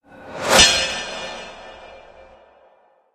Sword Whoosh In Hall

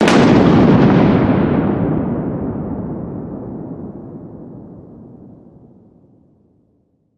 Thunder Clap

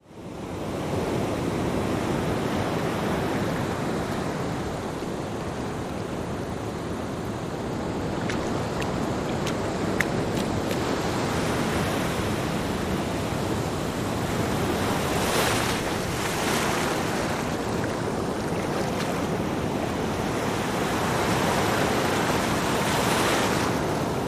Ocean Beach

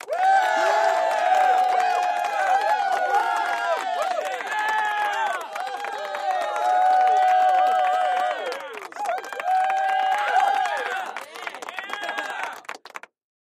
Crowd Cheer Clap Scream

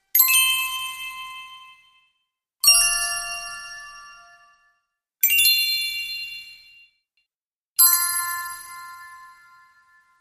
Icicles Shiny Bells

